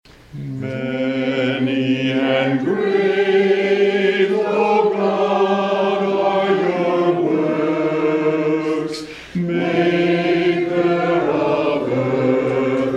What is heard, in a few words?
hymn; Many and Great, O God